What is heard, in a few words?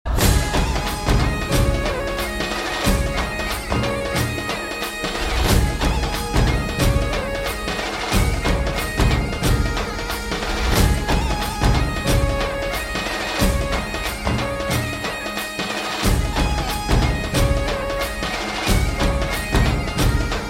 dhol with music, ringtone; nokia